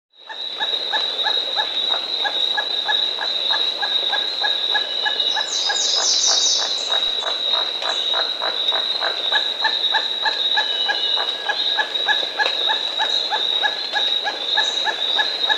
Emerald Toucanet, Aulacorhynchus, Nature's Songs, S. America